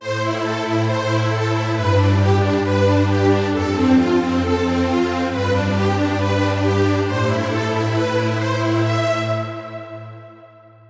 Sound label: Super Analog Stings; KORG OASYS; oasys assault